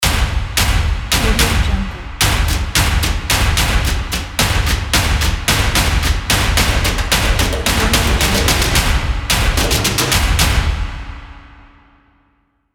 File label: powerful and action drums logo